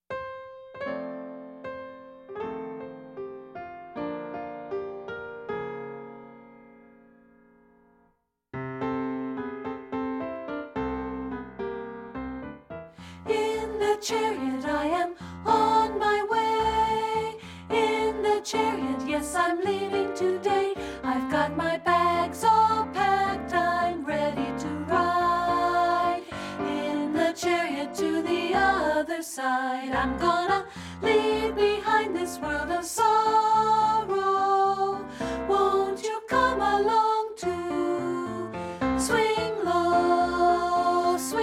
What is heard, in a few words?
ALTO; twopart